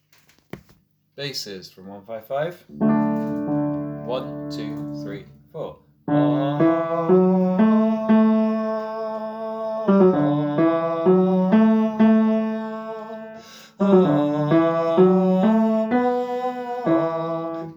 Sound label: Fishers basses, choir